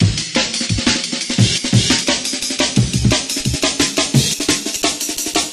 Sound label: Amen Break Loop With Rising Pitch 174 Bpm